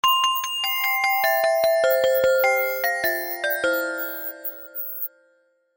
row your boat sms

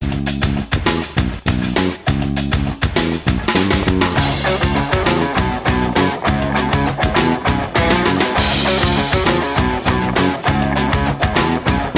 Skip A Beat

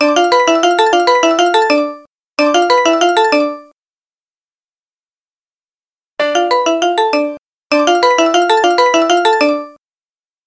Cell Phone HTC

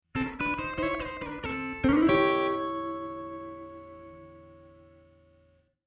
Blues End Theme

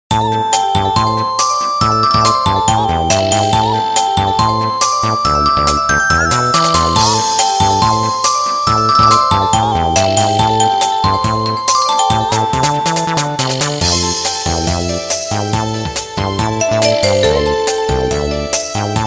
Nokia Original

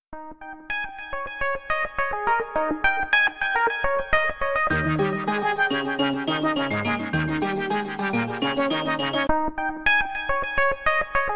Samsung Galaxy Z Fold 6

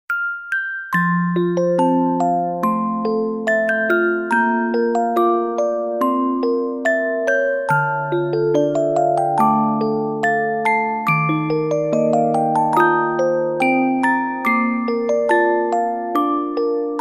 Musicbox

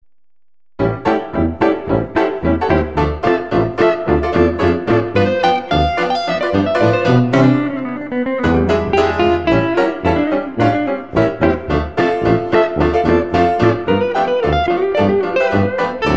Upbeat Jazz Piano

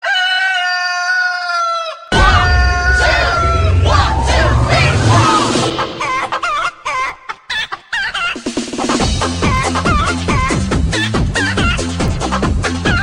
Farm

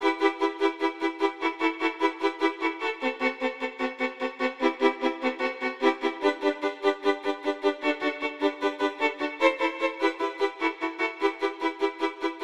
Chop Down Violins

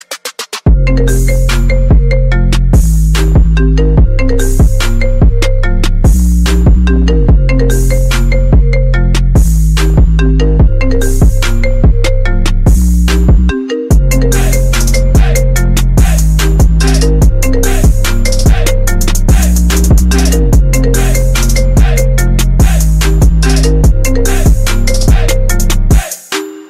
iphone 11; klingelton; iphone 8

